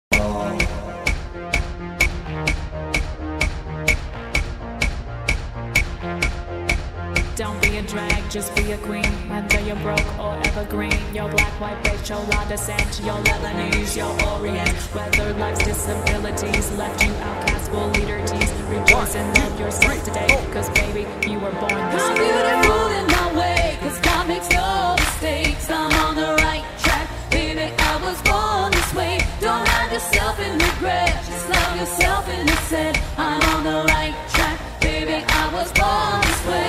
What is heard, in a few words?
Vocal ref